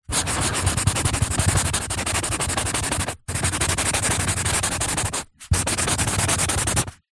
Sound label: office marker pen scribble scrub out on cardboard sheet